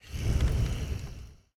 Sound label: grunt; fenrir